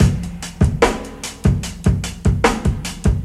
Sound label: 74 bpm drum loop sample C# key, Free drum loop - kick tuned to the C# note